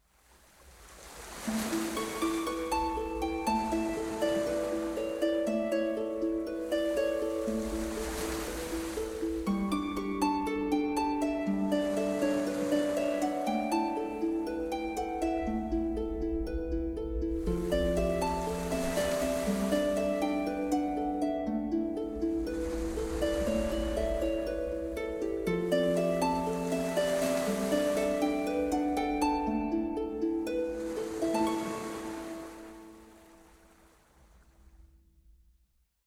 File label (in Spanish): Xiaomi 12X, tono de llamada